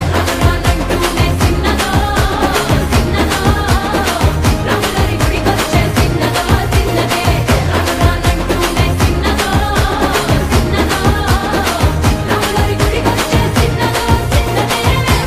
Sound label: Remix Bgm Ringtone